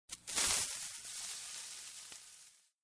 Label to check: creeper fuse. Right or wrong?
Right